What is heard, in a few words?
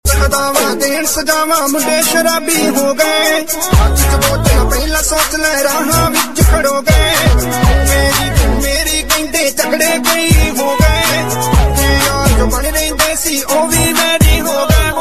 Two Step Bhangra